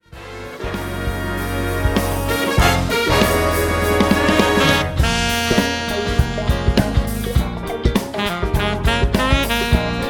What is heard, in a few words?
Slo Funk